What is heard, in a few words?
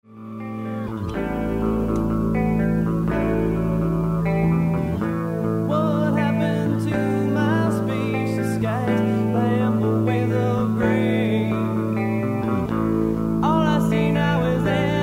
Violent Cartoon